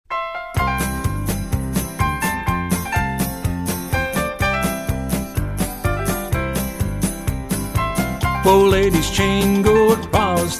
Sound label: vocal